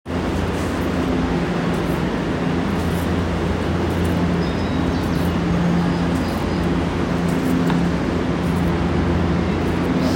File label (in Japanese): 接近, train sounds; 小田急線本厚木東